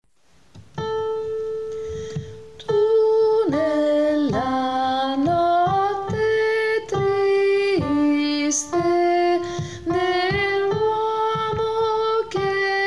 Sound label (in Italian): contralti; tu nella notte triste